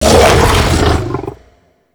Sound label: Howl Run Start; Warframe; Voruna